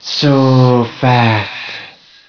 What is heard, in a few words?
slave alert; svencoop; hungerslave